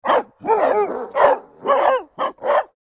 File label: Angry dogs aggressive barking and growling